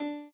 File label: chord